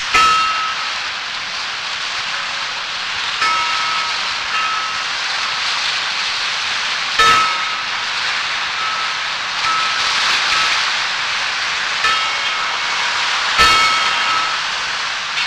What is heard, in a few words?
Bass Harbor Head Buoy